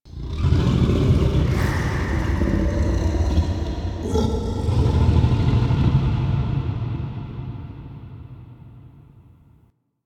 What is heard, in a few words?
Brain Grunt One Off